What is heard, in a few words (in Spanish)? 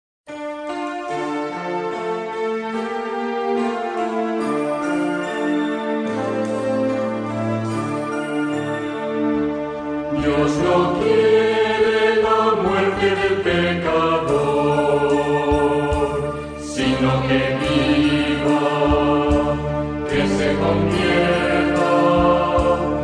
Cuaresma (Canto de entrada); Cantos de Entrada y Comunion